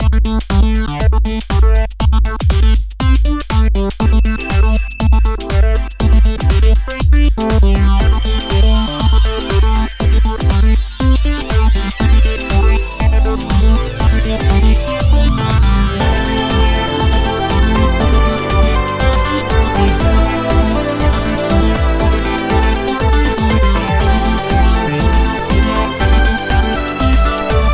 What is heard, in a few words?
trance